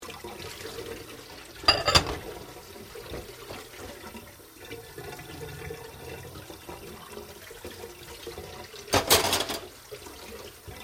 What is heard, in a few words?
Washing Dishes Running Water; Household Appliances; Appliances (Sound Effects:Household)